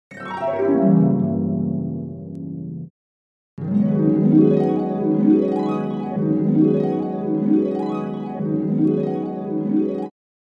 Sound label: Virtual Harp Glissando Ascending Descending; Chordophonet; Syntheway